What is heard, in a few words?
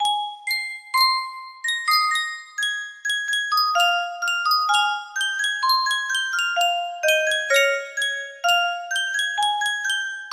yunsheng custom tune music box, music box melody